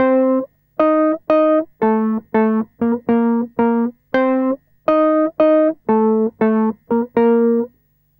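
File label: 117 Cm DISCO guitar, 117 BPM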